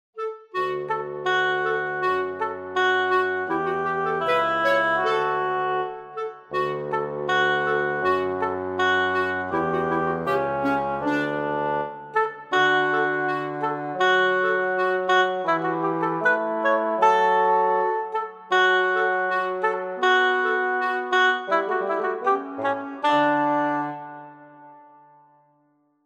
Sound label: Cuckoo; novembeat 2016